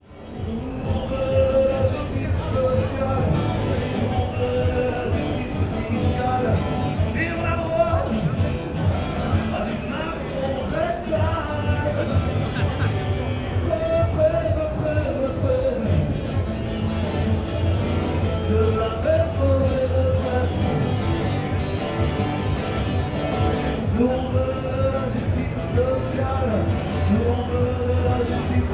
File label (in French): guitare manif; Salut au guitariste solitaire.; Manifestation, Paris, 07/02/2023